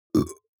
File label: human mouth burp short; smartsoundfx